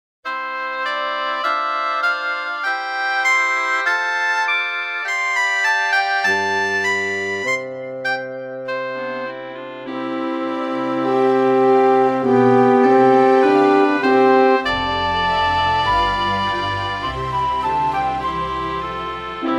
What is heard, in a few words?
Joyful bells